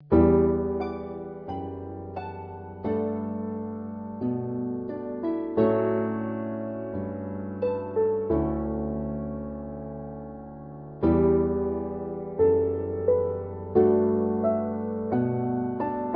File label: calm